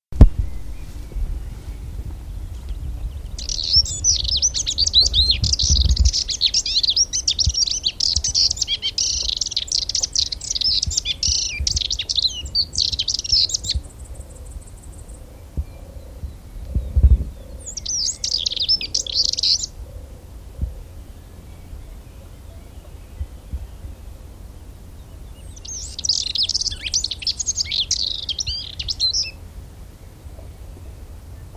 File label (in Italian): moltonii, canti sterpazzolina